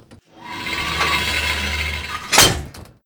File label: Engineer Door Op; moul-assets